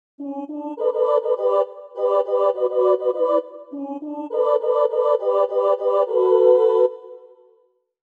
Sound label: Trio